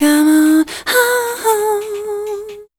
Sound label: RHY LINE, Voice Spectral; RHYTM